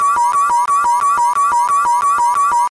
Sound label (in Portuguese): alarme agonizante